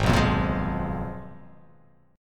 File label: Ab Minor 6th Add 9th, Ab; strummed; JGuitar